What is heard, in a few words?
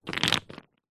stone medium sized set down on others; foley; zapsplat